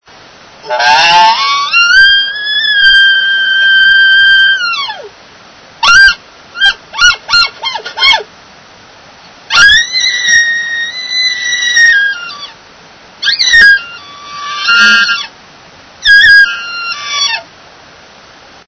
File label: bull, elk; elk 2001